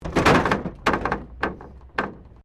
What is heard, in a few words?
Wreck Door Panel Clunk Metal